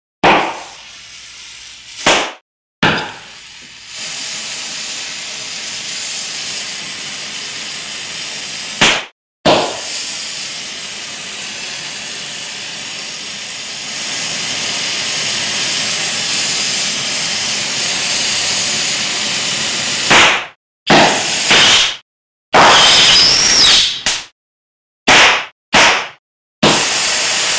Torch; Acetylene; Acetylene Torch On And Off With Loud Pops.